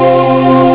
chord; Amiga Soundtracker Sample Packs

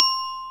BELL HI; Back In Time Records; Big Bang; TDP+BELLS2

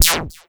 OB FX; Masterbits; Vintage Voltage; SEQ-FX

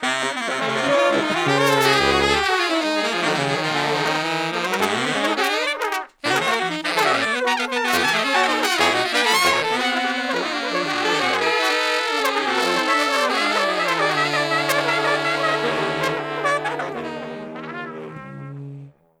Horn Section Noise; Traditional; Sonic Foundry (Sony Creative Software); Jazz Solos and Sections

